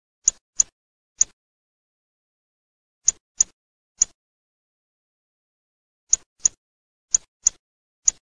FROSONE

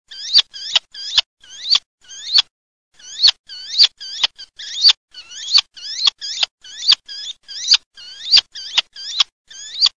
CIVETTA

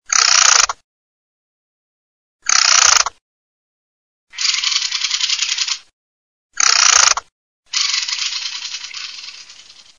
TORDELA CRE CRE

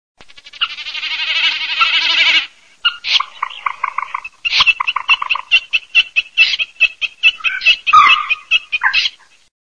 beccaccino gallinella, birdsound

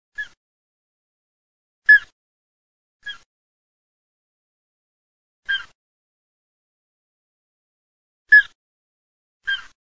capriolo

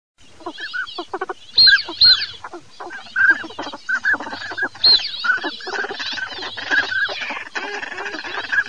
codo fischio maschio, birdsound

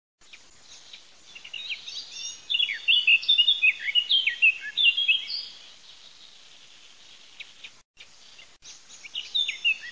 CAPINERA

